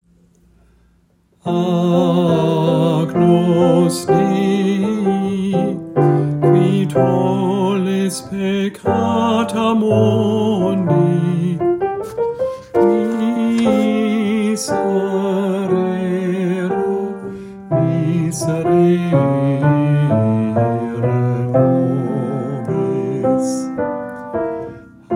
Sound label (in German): Bass Agnus